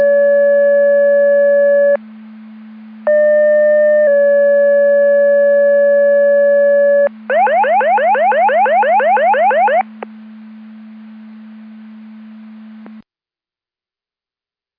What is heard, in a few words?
Siren; Andover Twp Boro